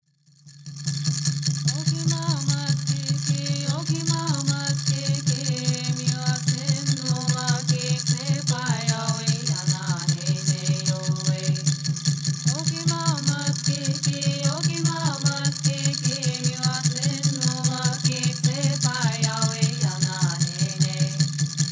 Native American Church Song

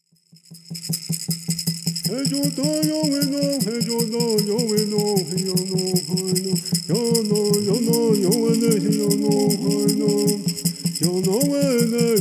Peyote Song

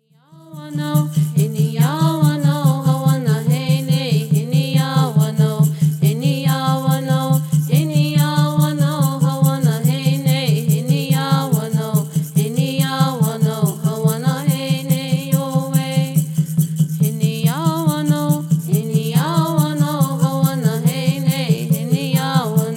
Peyote Song

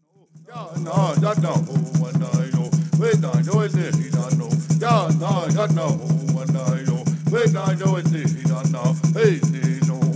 Peyote Song

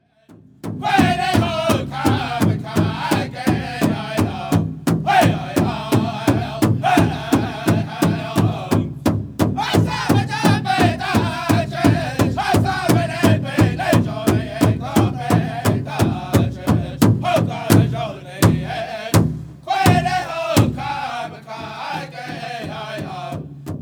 Jingle Dress

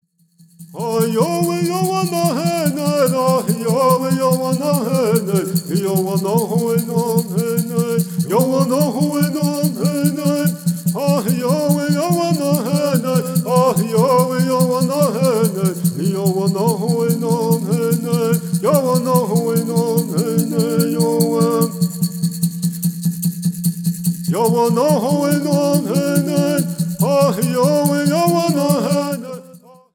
Straight Song

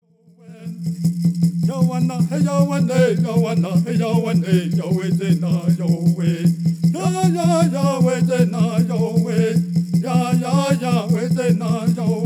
Straight Song

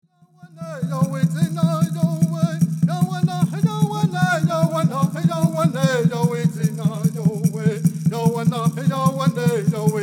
Straight Song

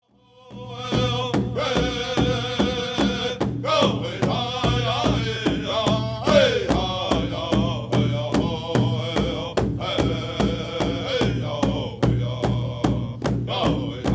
War Dance Song

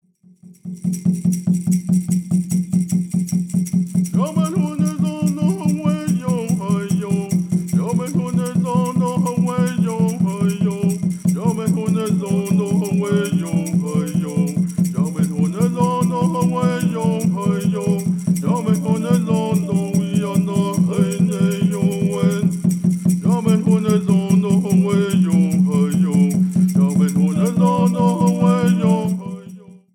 Peyote Song

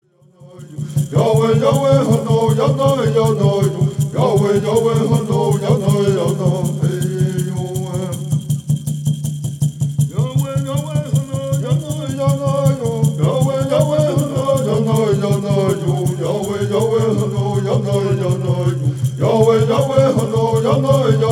Native American Church Song

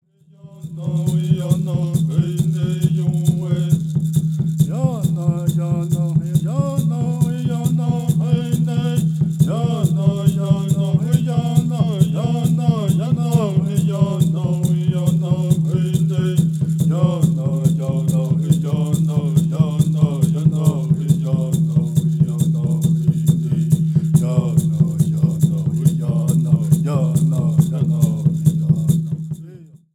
Peyote Song

